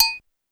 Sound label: Cowbell Light, Pitched Up, Clavia DDrum